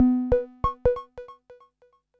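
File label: synth electro 140 A; Techno; Keys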